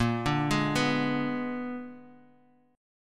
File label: A sharp 7th Flat 9th, guitar chord